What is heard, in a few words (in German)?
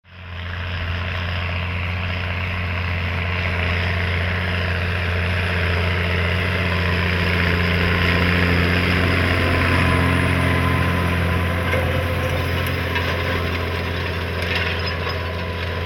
Massey Ferguson 8480, Motor: 6-Zylinder, wassergekühlt; Hubraum: 8,4 Liter; Leistung: 315 PS